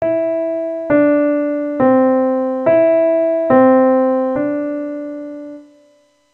Melodic Dictation Pitches Only

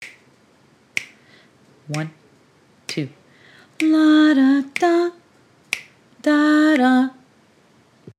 Melodic Dictation

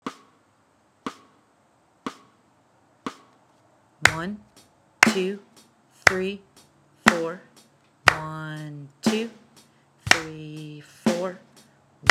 Half Whole Notes Rests Traditional

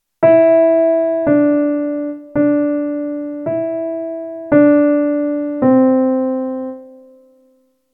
Melodic Dictation Pitches Only

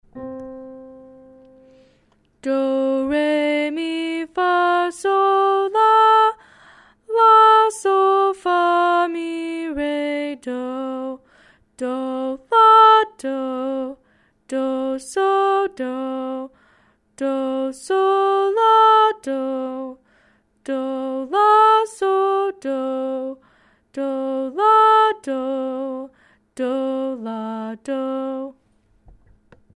Centering Song

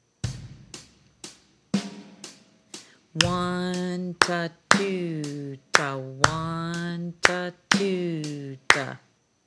8th Notes Compound Time; Traditional

